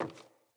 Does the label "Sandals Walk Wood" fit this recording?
Yes